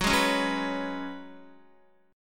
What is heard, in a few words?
Gb Suspended 2nd Suspended 4th, Guitar; strummed; x,9,8,6,6,7; 4F,4A,5C,5G,5C,6E